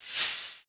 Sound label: Shaker; Equipped Music; Loungin House